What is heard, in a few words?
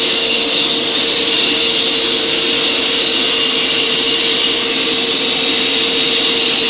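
Aircrafts A-10 APU Cockpit; DCS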